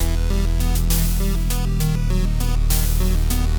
music sewers intro; skellboy

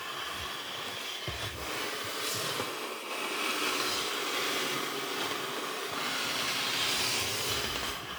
wood slide loop; teardown ps5 windows xbox series xs gamerip 2022

